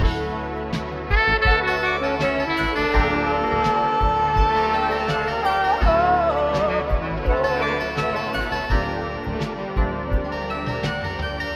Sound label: sax voice keys unsettling, Loops; Phonky